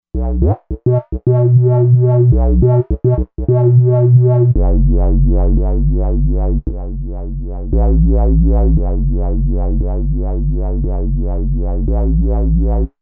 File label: D n' Bass